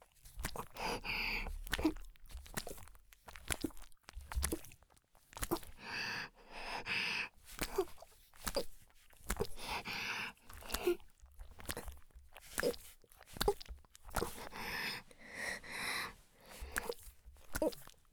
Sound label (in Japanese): ギシアンループ; 田舎の別の無感情少女と自己犠牲愛; おまけ (第4、5話のギシアンループバージョン)